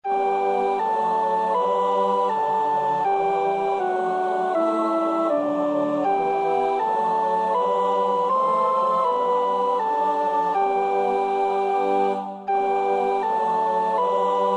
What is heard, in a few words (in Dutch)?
sopraan; Bach (Johann Sebastiaan); BWV 032; 6 Mein Gott, öffne mir die Pforten